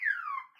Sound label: Accident; Dr. Driving 2; Android